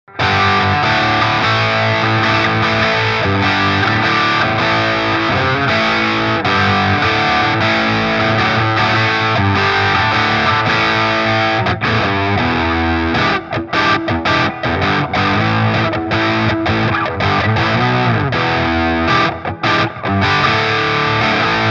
Bogner Ecstasy Mini Amp Head Variac ON Freq Neutral Gain 10 h